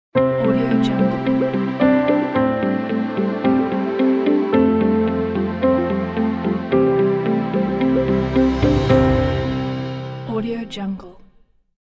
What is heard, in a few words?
ethereal logo